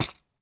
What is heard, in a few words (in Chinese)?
锁链 踩踏声